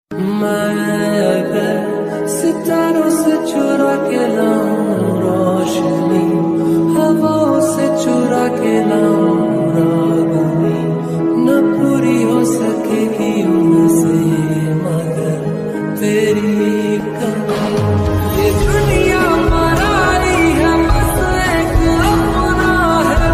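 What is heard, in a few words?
romantic ringtone love ringtone hindi ringtone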